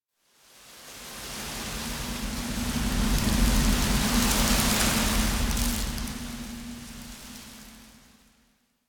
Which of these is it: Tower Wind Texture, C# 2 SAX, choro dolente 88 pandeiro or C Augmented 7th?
Tower Wind Texture